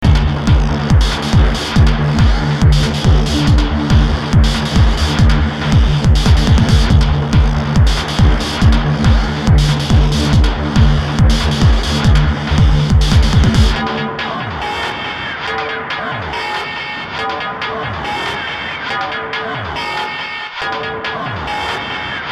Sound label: thunder